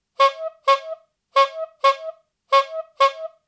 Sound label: Bicycle hooter